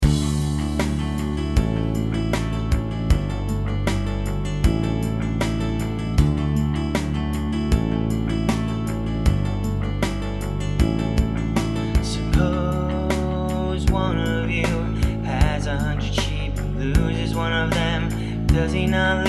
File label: with vocal; Luke 15 4-7; boys camp